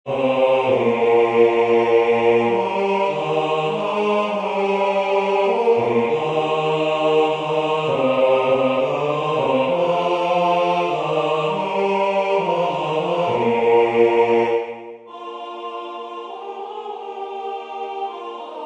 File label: Hymne à la nuit Basse; Concert du 13 décembre 2024 en l'Église St Pierre - St Paul